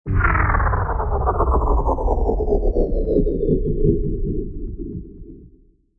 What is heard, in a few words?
Drk Crnch Dve, Dark and crunchy diving sound; Dark Crunchy Dive; Halloween Sound Effects; Sound Effects:Seasonal